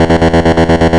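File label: Sawtooth Tremolo E1; Ableton Foundation FreePacks; Foundation Glitch Toys & LoFi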